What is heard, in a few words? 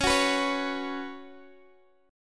Db 7th Suspended 4th Db down; strummed